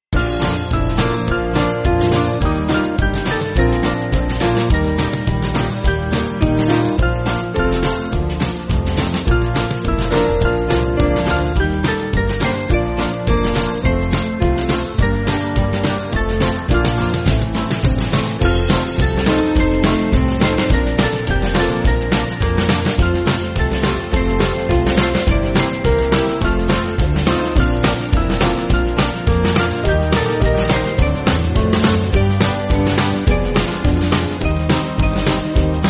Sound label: Band